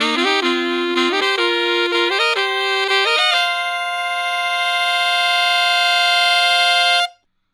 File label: SAX SECTION 124 C